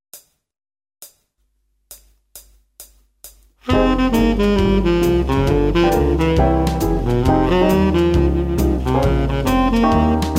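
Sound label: Jazz melody; MyVirtualBand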